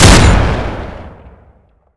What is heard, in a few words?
shotty lmg; garrysmod